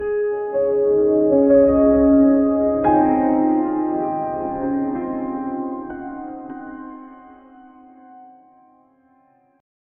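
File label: calm but eerie piano fading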